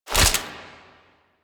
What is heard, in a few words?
Sniper Reload End; Warframe; Weapons; Skins; Venkra Sniper Skin